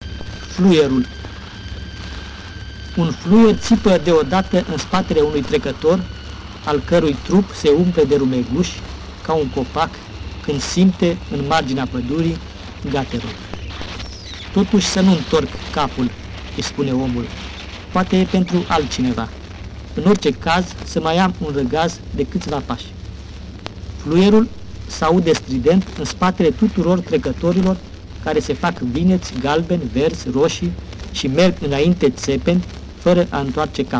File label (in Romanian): fluierul